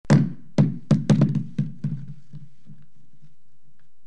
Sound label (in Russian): yabloko